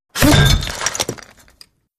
Bullet Impacts Hitting Masonry With Whir In And Debris